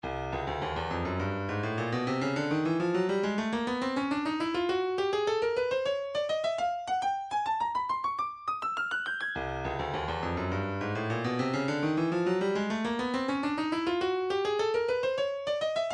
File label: 130 wavedrum, midi